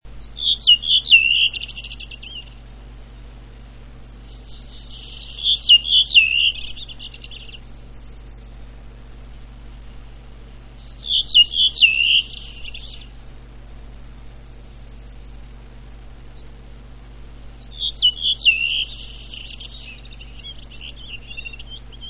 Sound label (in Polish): śpiew droździka - długi przykład; turdus iliacus; wroblowe; turdidae